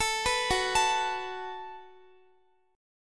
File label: Gb Minor Double Flat 5th, Guitar; arpeggiated